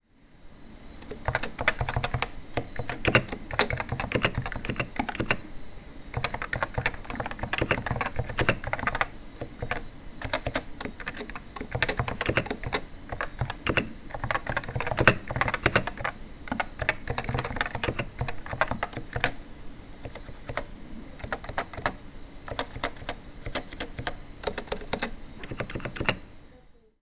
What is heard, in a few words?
Novatouch, keyboards